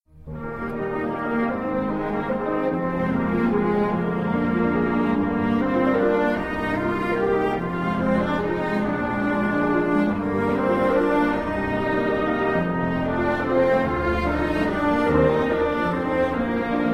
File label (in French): Menuet